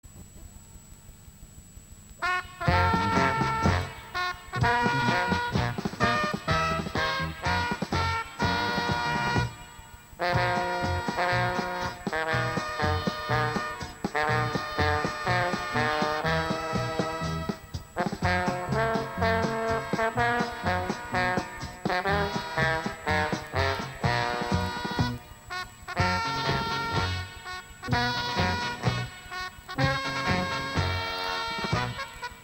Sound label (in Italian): strumentale; Valderi, Valdera